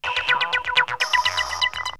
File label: Melody loop; ZERO G - Datafile DISC 1